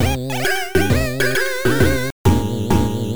Lose; Tengai Makyou Fuun Kabukiden; PC Engine Super CD-ROM2

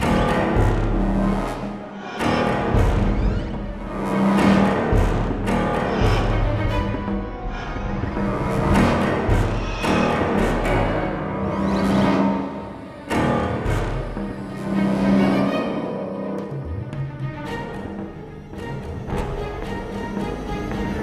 Alien Jungle; Crysis

